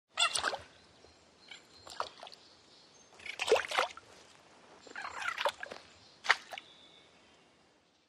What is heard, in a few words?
Frogs Juve Bullfrog A